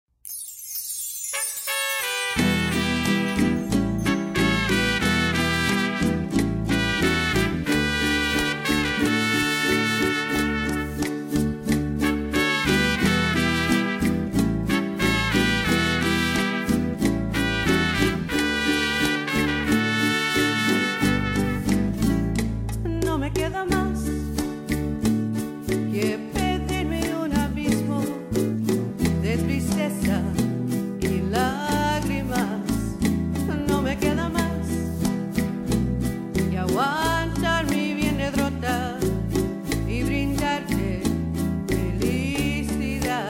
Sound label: Mariachi